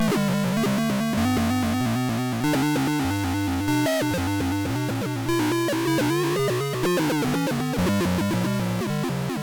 melody pitch vibrato; ohmegalpha

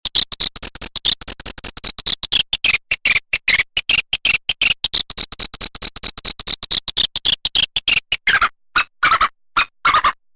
light control; optidog

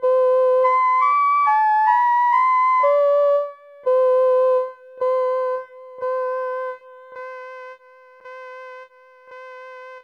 sk-2 flute env

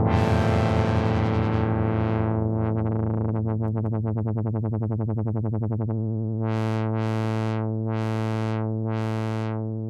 crumar brass

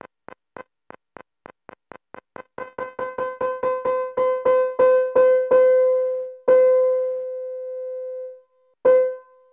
piano; sk-2 piano env

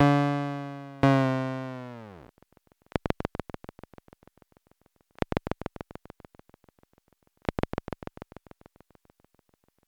piano pitch; kosmic shade

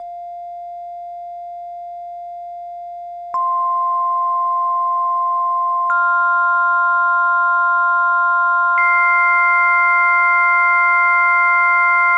pss-140 infinite notes

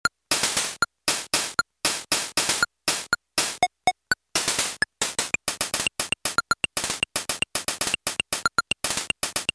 rhythm pitch up

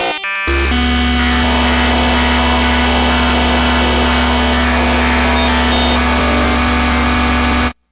polka nitemare; pss-140

